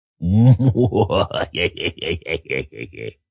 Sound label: Laugh Silly, Male laugh, deep, sinister, cartoon voice, (human voice); Laughter; VoiceBox